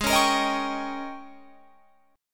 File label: Ab Major 7th Suspended 2nd Suspended 4th Ab, down, Guitar, Open F; AbM7sus2sus4 strummed